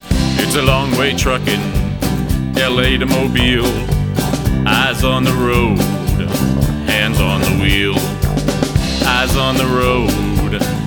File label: Country Cue